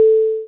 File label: short beep tone